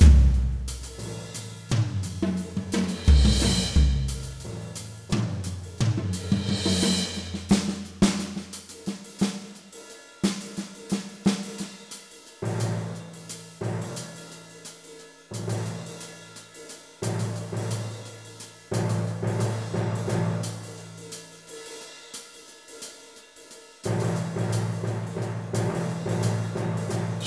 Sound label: Music Battle Layer Perc; Plants vs. Zombies Battle for Neighborville; MushroomBoss